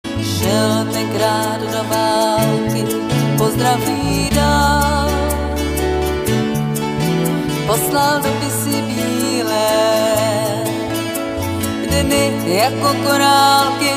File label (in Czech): Tamtamy; Vystoupení na plovárně v srpnu 2017